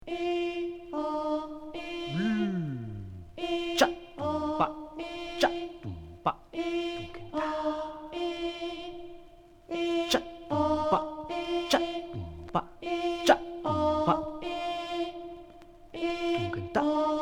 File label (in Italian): strument concerto